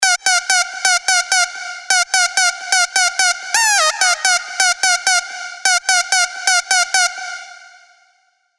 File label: Dirty Dutch Leads; Floor Still Hot; Revealed Spire Dirty Dutch Leads Vol. 1